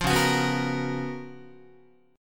Db 13th Guitar, Db13 chord; strummed; JGuitar

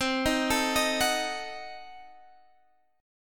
C Diminished 7th, Guitar Standard, chord; Cdim7; arpeggiated; 20,18,19,20,19,x; down; capo=9

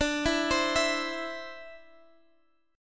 D 7th Suspended 2nd, chord

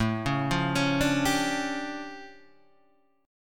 D Minor Major 11th, A

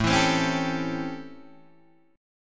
A 7th Sharp 9th, Guitar, Open D

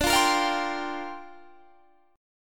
C 7th Suspended 2nd Suspended 4th D

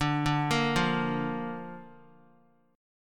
D Minor Sharp 5th D Guitar; Listen to Dm#5 arpeggiated; JGuitar

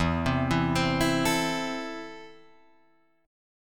E Suspended 4th Sharp 5th, Guitar Open F; Esus4#5 arpeggiated; 4,7,4,7,7,4; Esus4#5 chord

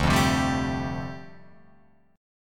D Minor 11th D down Guitar Open F, Dm11 chord

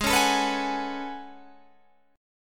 A Major 13th, guitar

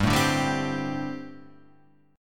G Suspended 2nd Suspended 4th, Gsus2sus4 chord; strummed; JGuitar

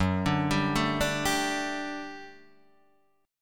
G Major 7th Suspended 2nd F sharp

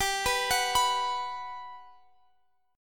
G Major 7th G down Guitar Standard; GM7; arpeggiated; capo=3

